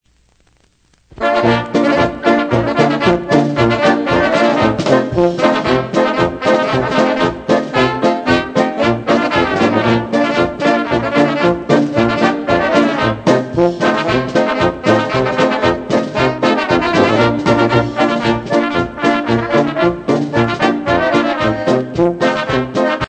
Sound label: SAUERKRAUT POLKA